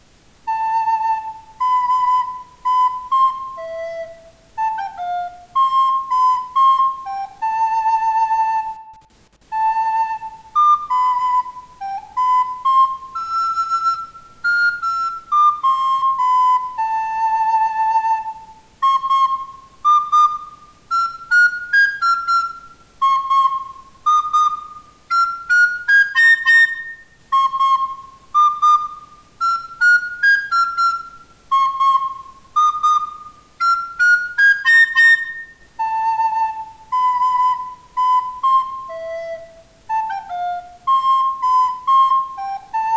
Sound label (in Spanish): ocarina flauta